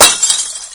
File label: glass; super trench attack 2